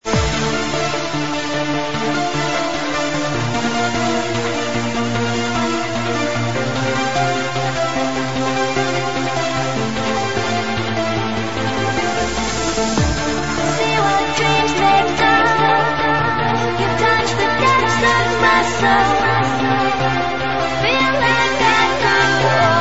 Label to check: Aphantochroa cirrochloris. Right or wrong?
Wrong